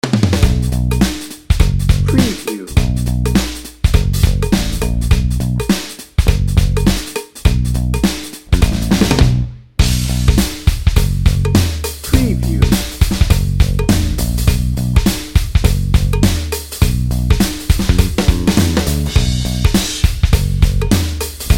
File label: funk n brass dnb